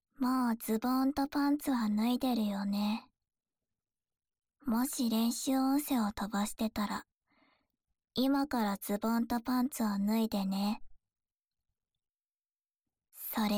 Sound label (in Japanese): 耳舐め, ASMR